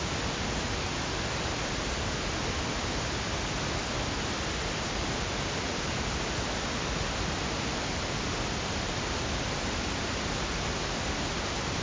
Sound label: Noise Pink Stereo